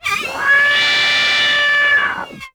SCREAM C4, SCREAM SYNTH, Yamaha VP-1

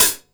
DKIT1 HHC2, Drums Acoustic; USB Soundscan vol.10; AKAI; 90s sample CD; DYNAKIT 1

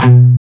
CHUG, Guitar; CHUGS; East West - Steve Stevens Guitar Samples Collection

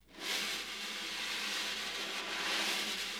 Snare Drum

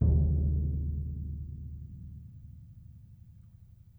Bass Drum One Shot; Orchestral; Percussion One Shots; Sonic Foundry

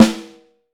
Snare Drum; Q Up Arts; Johnny.C's Vintage Snare Drum; 6.5X14

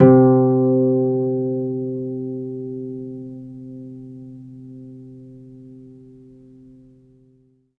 HARP SUS; ILIO; Synclavier Strings

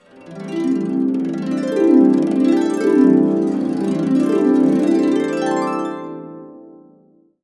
C CASC UP DN, Harps

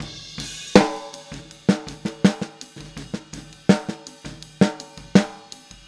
Boogaloo Chorus Snare, 82 BPM

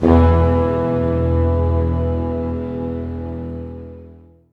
SNAR, Snare Army; East West - Scoring Tools

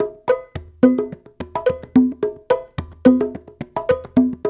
108 Triangle; Tri.Again

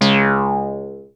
2600 C#3, ARP 2600; Hollywood Edge - Giorgio Moroder Rare Synthesizer Collection

